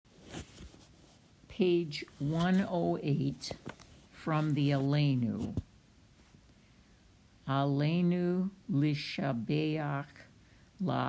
Aleinu Hashkiveinu

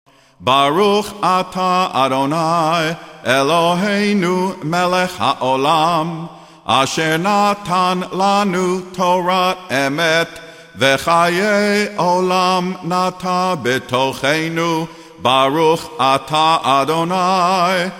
bracha torah; Cantor Recordings; Saturday

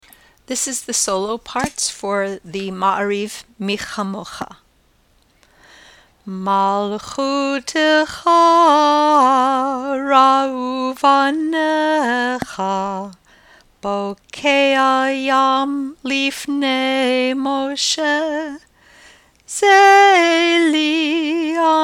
Mi Khamokha solo

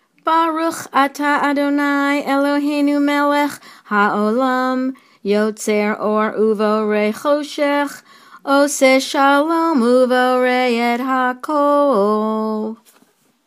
Yotzer

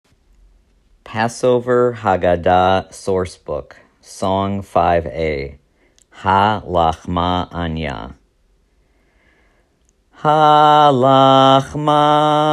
Passover Haggadah Ha Lachma Anya

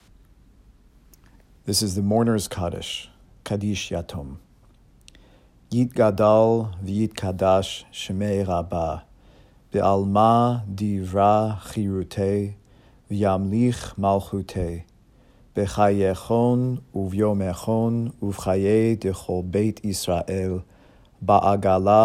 mourners kaddish; Yizkor Book

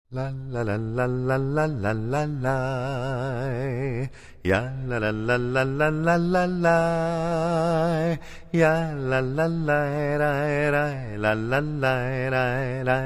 Blessings Barchu

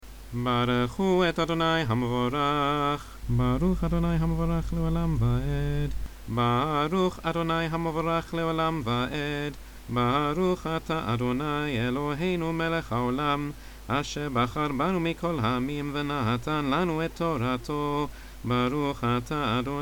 Torah before

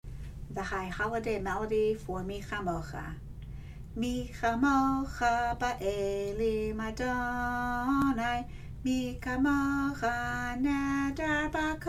mi chamocha; high holiday

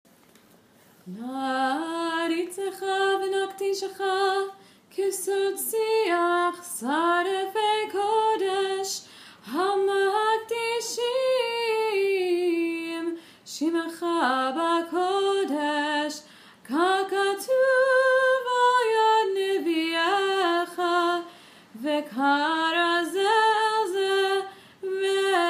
Naaritzcha Kadosh nusach

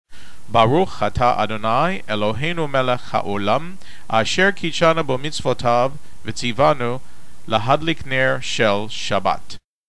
candle blessing

